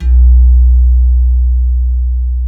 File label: KEY GONG, Bronze Gamelan; E-MU Formula 4000 Series Vol. 4; Earth Tones